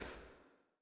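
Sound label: choir "P", Women; Sound & Vision - Gigapack I CD 2 (Roland)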